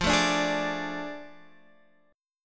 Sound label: A Major 7th Suspended 4th Sharp 5th